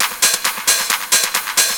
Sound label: loop; SoundFactory; SMPMANIA